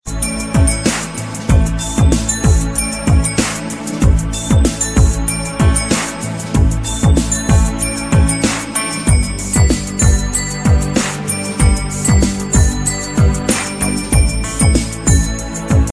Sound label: bell bass ringtone